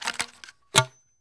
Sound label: glaunch; The Sum of All Fears